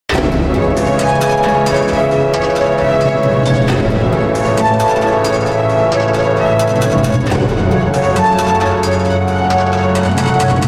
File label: Tension